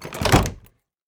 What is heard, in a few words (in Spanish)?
CERRAR PUERTA; Yesterday Origins; Switch, PS4, PC, iOS, macOS